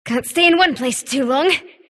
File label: sylph move pain; dota; dark willow